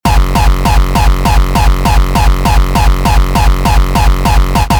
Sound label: Crude Roar, kickoorator